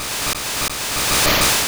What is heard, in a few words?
Razor Wind Charge; sfx; attack moves; pokemon; gen 2; gsc